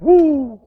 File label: woo